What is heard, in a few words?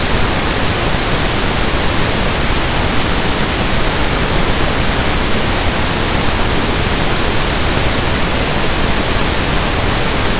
Pink, Noise; Denoising